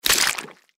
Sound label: explosion; Fruity Burst 2